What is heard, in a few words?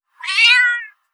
little cat pain meow; gorbe